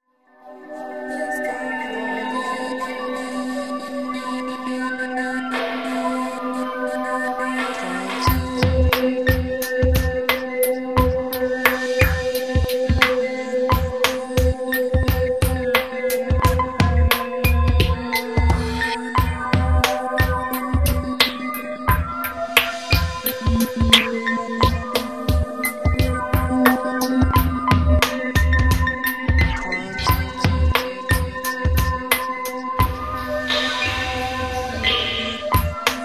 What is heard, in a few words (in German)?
schlaflied